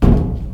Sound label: footsteps infected run duct